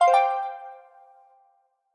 thong bao z3 notification sounds

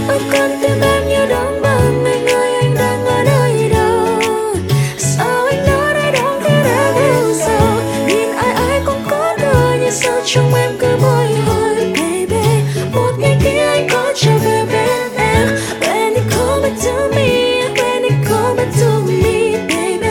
Nhac Chuong

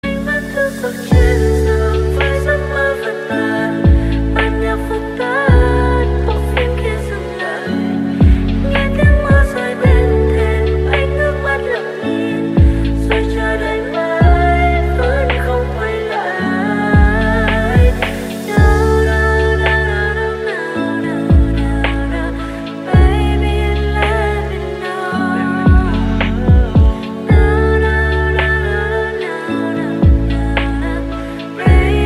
nhac chuong lofi

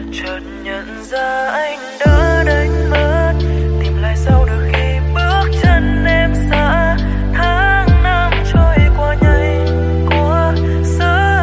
Nhac Chuong Lofi

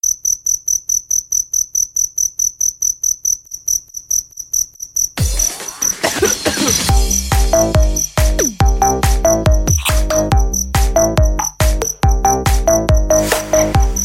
Tieng De Keu Remix

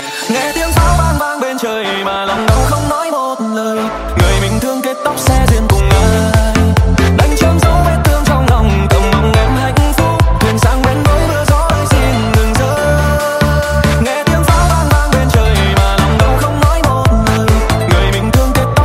nhạc chuông remix

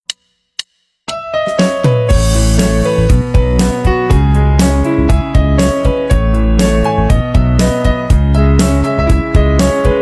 khong loi piano version

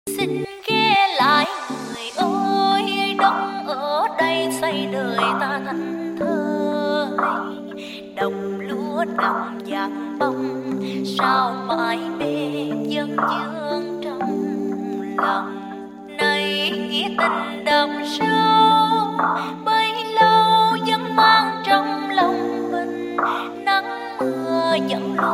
nhạc chuông câu hò lofi cover

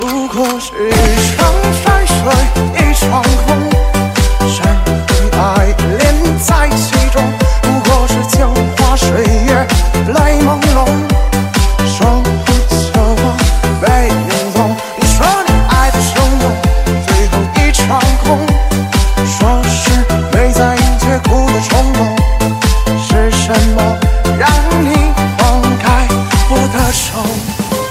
Nhac Chuong Remix

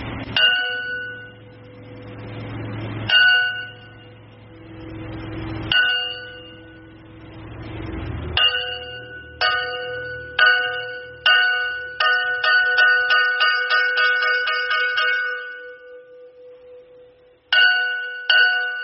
bao thuc cong an

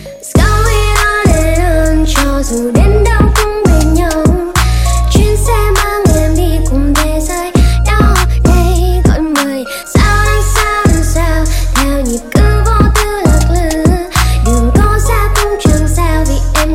Nhac Chuong Music Box

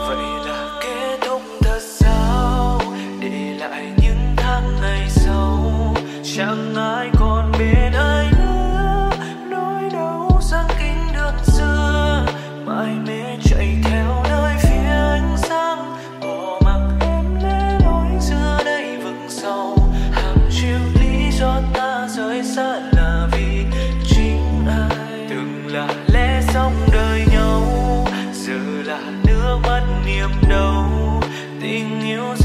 Nhac Chuong Lofi

